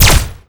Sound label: laser rifle; garrysmod